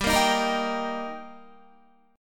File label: G Minor Sharp 5th G Guitar, chord; strummed